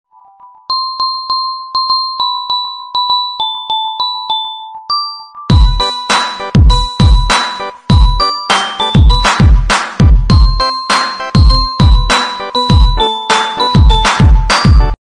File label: Merry Christmas Ringtone